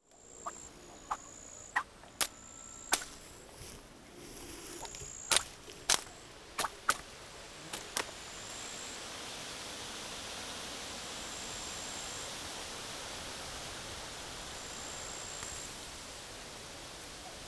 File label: tree sounds